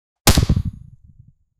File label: volleyball being hit